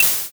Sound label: can open